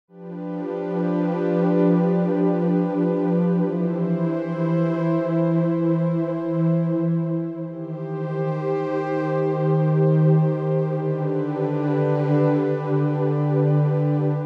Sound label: Wide Pad